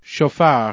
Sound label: shofar